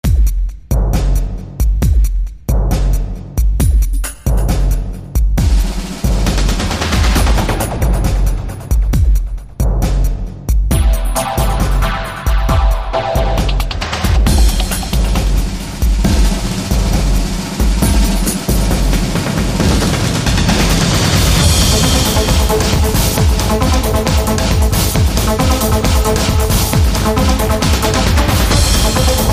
Tension Builder

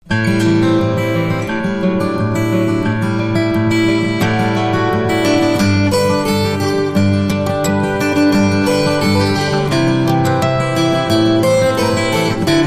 Jingle Bells, without Jingle Bells

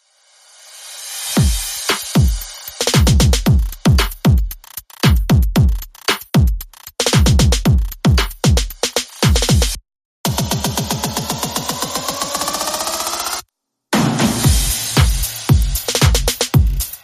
Sped Up, Drums Only

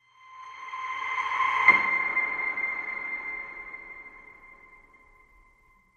Piano Ping Rev C

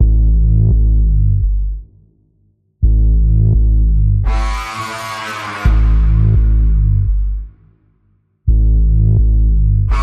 Insidious Pulse Bends A, Key of A

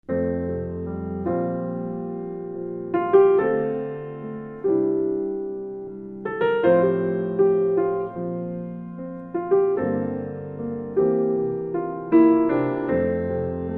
Solo Piano

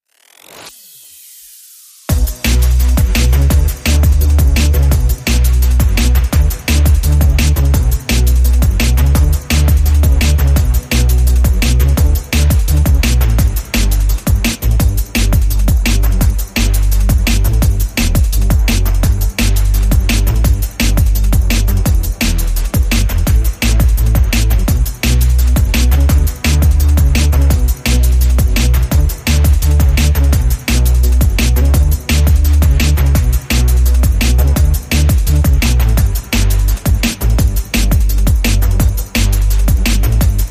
Crackling Beats; Sub

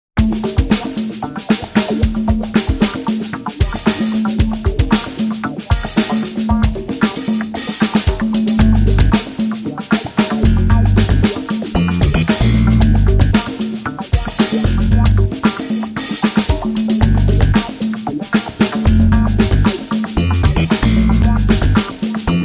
No Horns

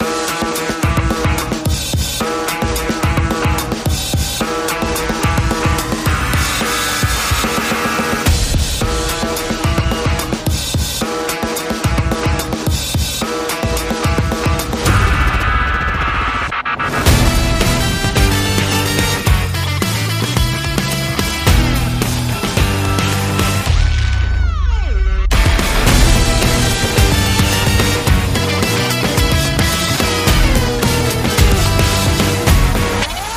Victory Run, No Vocals

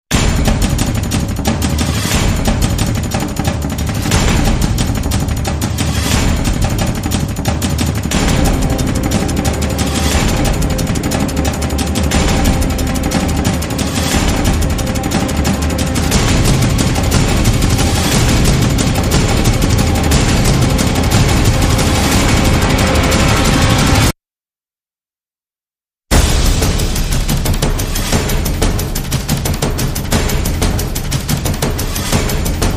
Drums

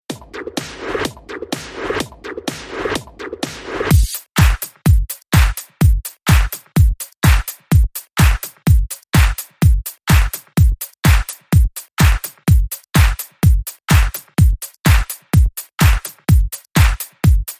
Ouzo Beat

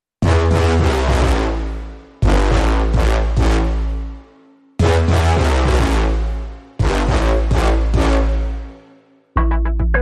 Inst No Drums

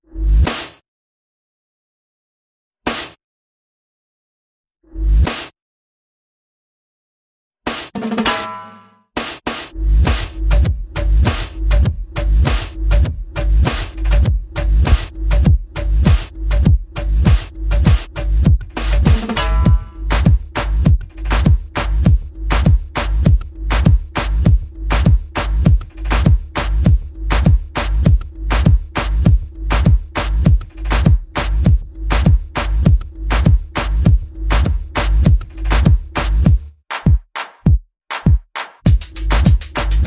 Perc and FX Only

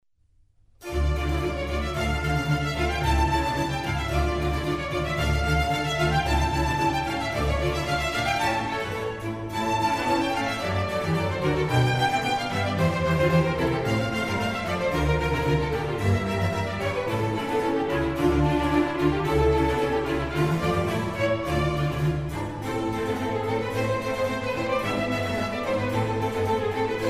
Concerto for Strings in D minor; RV127; Antonio Vivaldi

